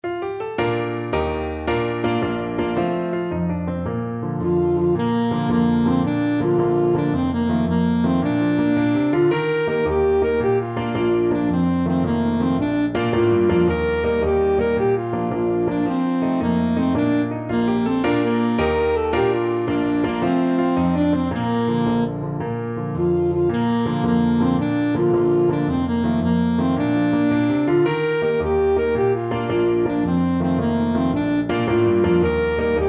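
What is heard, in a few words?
banks of the roses, alto saxophone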